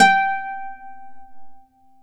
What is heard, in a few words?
Celtic Harp